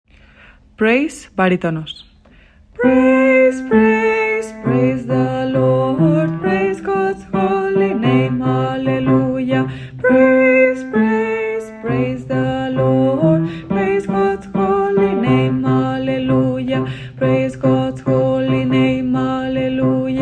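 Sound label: praise baritone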